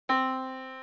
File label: Yamaha Disk, piano sound